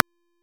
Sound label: Signature Pistol Last Shot; Warframe; Tenno; Temple